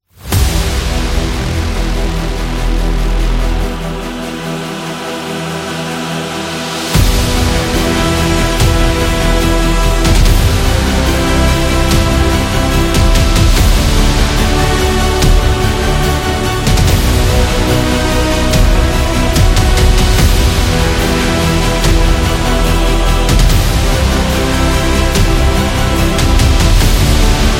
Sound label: Epic Fight